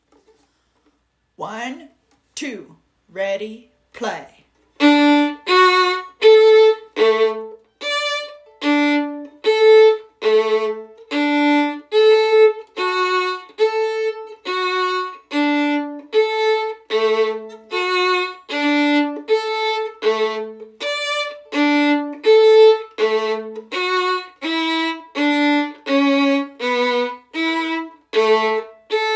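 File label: Trumpet Tune Violin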